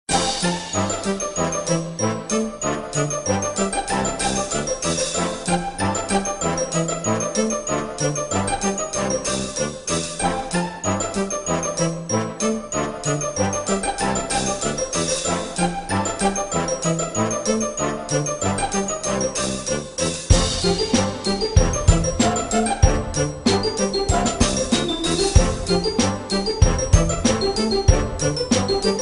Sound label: Orchestral